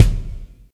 thick kick drum C key

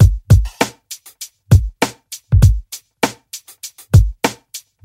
99 bpm drum loop g key

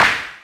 raw acoustic snare a sharp key

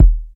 subby steel kick drum e key; kick drum samples

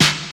original steel snare drum D key; snare drum samples

